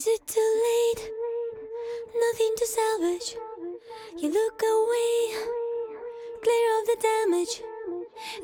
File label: vocal loop, acapellas